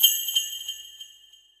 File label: suzu